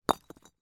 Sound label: impact brick hit ground